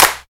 hand clap sound f sharp key, Royality free hand clap tuned to the F# note.